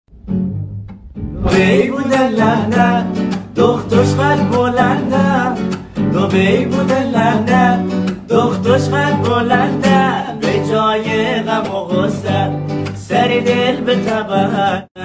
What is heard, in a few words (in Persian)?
Gitar